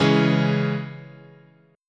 Db Minor, chord

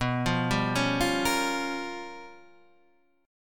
G 7th Sharp 9th Flat 5th

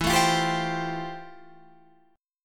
F Major Add 9th E; Fadd9/E strummed; JGuitar

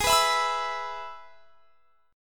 G sharp Minor Sharp 5th; G#m#5 strummed; x,23,21,21,24,24

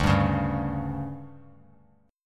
D Major 7th Suspended 4th, chord; DM7sus4; strummed; JGuitar

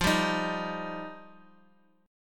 F 7th Suspended 2nd F down; F7sus2; strummed; JGuitar

